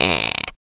Elec dmg; Seer; GamigoNA

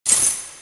Money Get; Seer; GamigoNA

